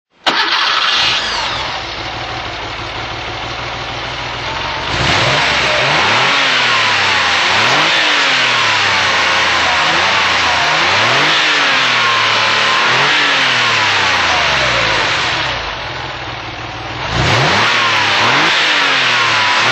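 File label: porsche carrera 3.2 throttle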